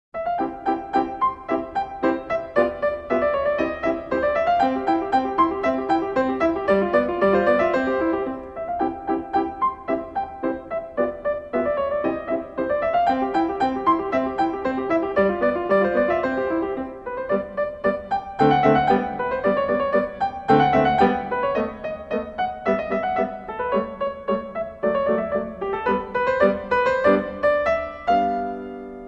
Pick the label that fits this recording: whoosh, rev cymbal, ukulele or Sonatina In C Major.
Sonatina In C Major